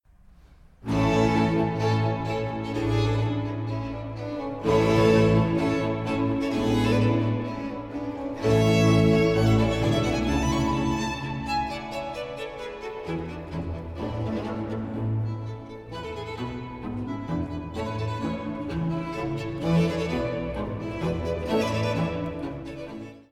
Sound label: B minor major for 2 violins and basso continuo Allegro